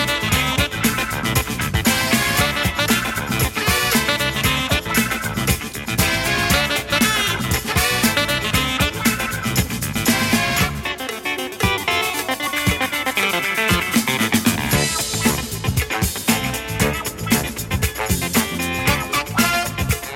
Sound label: Scratchin